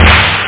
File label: snare; OctaMED SoundStudio V1; drums snare